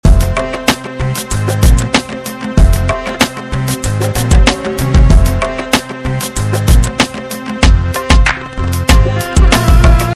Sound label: 95 bpm